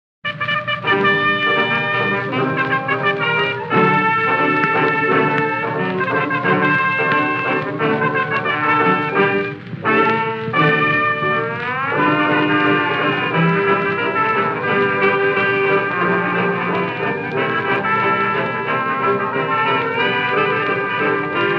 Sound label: Blues; memphis